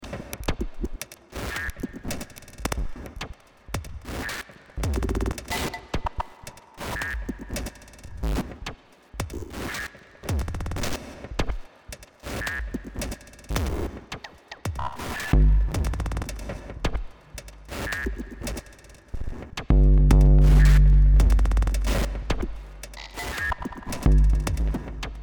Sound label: drumloop